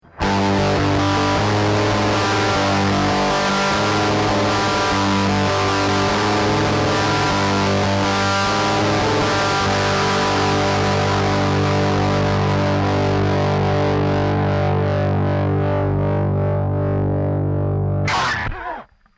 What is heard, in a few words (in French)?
disto arpege; arpège son disto sans reverb